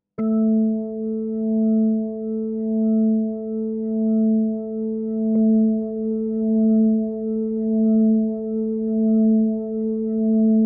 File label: Vibratos